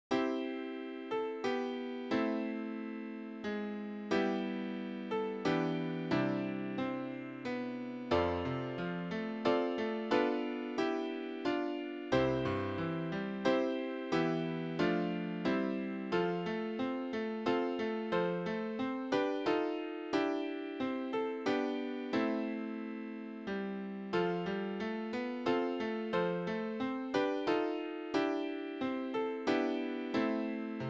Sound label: easy piano; Silent Night